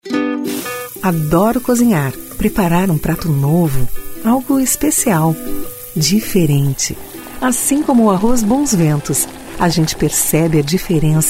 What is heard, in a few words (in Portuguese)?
musica